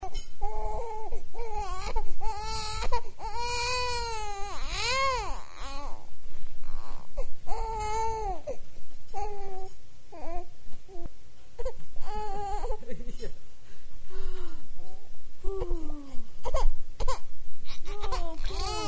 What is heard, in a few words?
crying